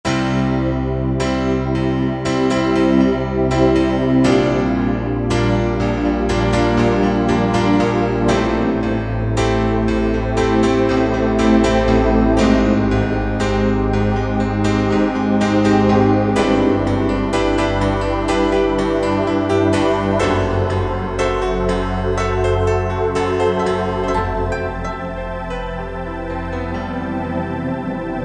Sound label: Yamaha TG500; Preset Performance; CO Dream